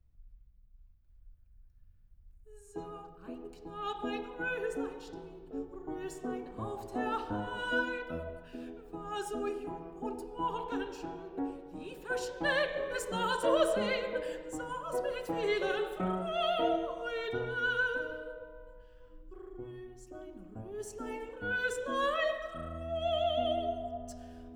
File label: song for voice & piano; Schubert